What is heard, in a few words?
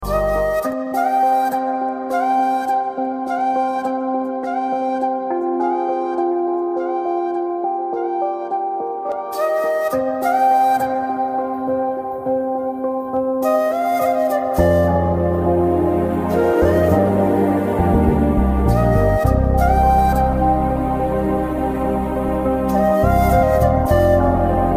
Romantic Flute